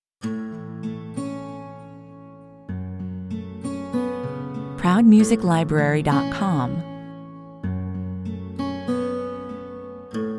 Stormy atmosphere at the beginning, nature sounds, fascinating melodies, guitar, 97 bpm